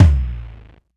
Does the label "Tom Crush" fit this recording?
Yes